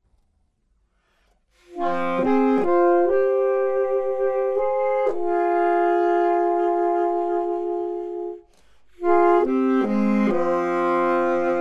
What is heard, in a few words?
accomp